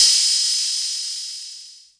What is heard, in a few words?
606 cymbal, drum machines; 606 Basic